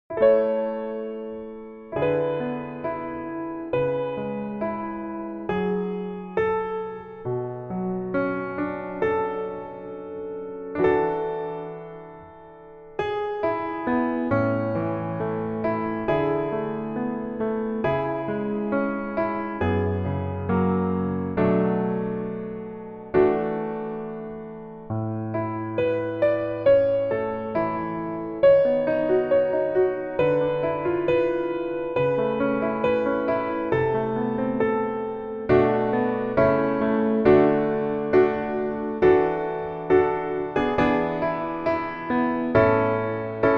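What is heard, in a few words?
Piano version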